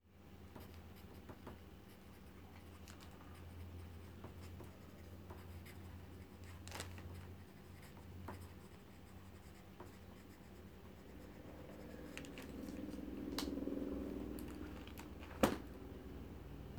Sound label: writing and closing book sfx